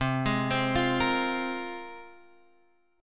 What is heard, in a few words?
C 7th Suspended 4th Sharp 5th, Guitar, Standard; arpeggiated; capo 3; 8,11,10,10,11,x